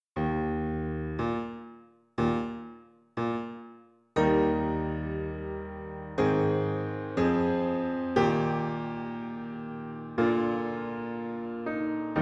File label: Piano